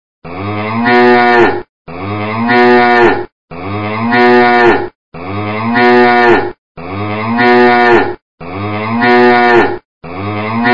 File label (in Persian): صدای گاو